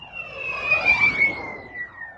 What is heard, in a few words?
plasma missile; Earth and Beyond; EA Games